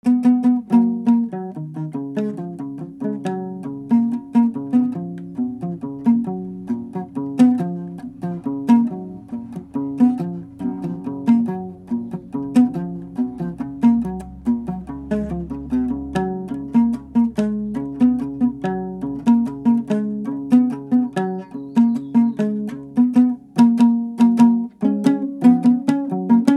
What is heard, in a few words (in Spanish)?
Zapateado, Guit